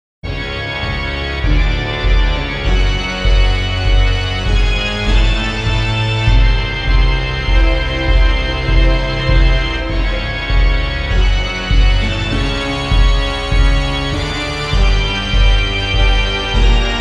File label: Lex Talionis March